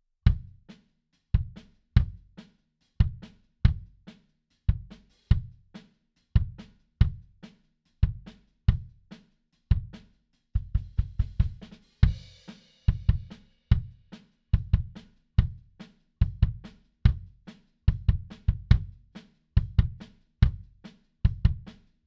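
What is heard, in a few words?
Bass Drum In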